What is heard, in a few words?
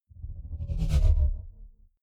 sci-fi vehicle drive by metal wobble fast; raumschiff